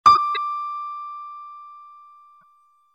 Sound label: PIANO; pictcode